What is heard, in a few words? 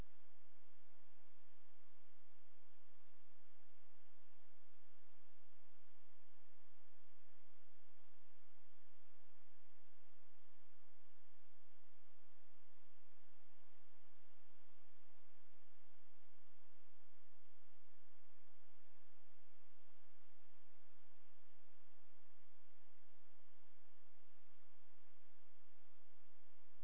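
hydrophone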